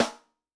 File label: Snare, Yamaha DTXpress-II